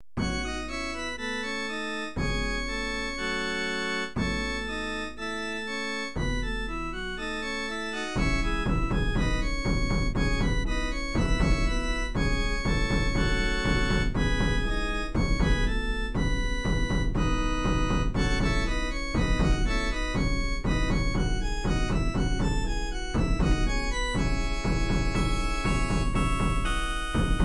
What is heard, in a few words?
battle song